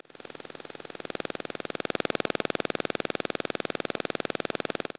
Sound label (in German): ledlampe, elektrosound